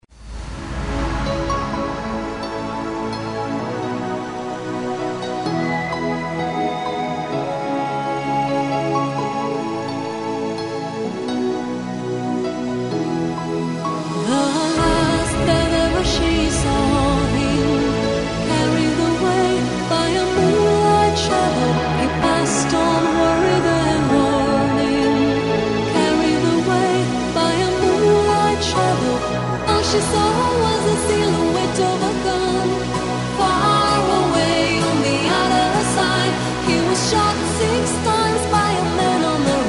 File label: disco tanz